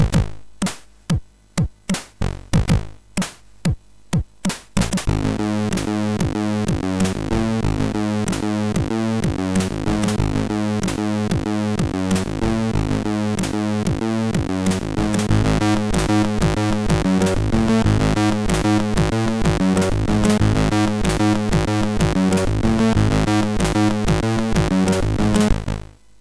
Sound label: White Noise, MOS6581R4; sid